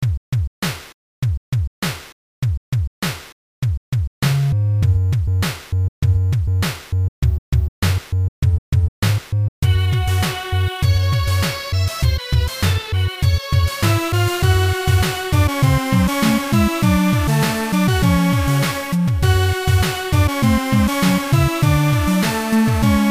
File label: Dark Scary Place